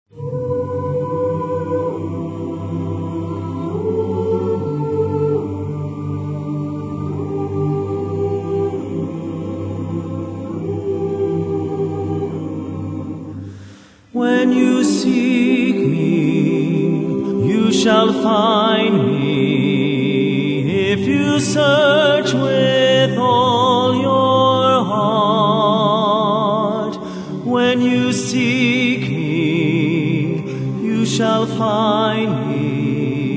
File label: Singers And Orchestra